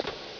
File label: step; Metal Gear Solid; gamesoundopedia